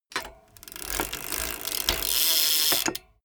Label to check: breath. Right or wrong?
Wrong